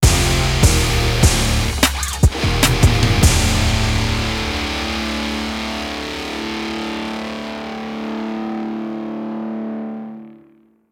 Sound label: mus death; Smite; hells syndicate